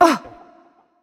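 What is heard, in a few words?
ouch, female; smite; voicepack; hera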